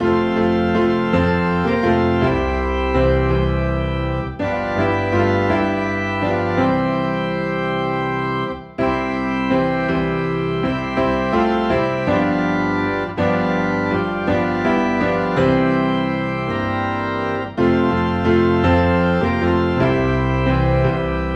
Piano and Organ Low in C

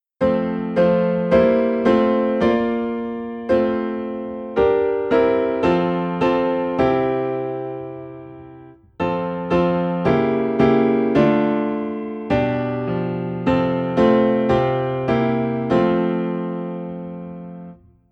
Piano in F; Like a River Glorious

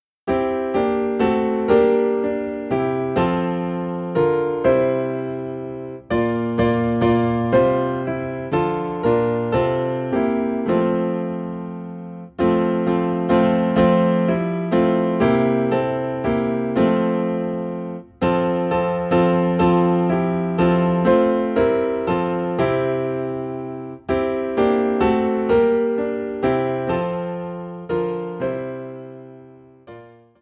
Piano in F; Sun of my Soul

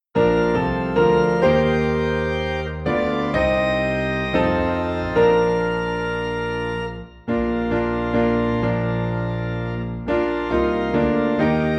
Piano and Organ - High in B Flat

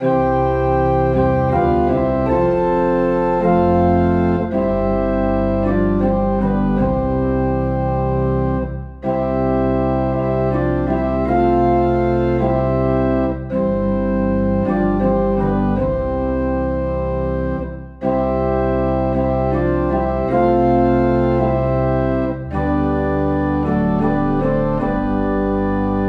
Organ Low in C; Take Time to be Holy

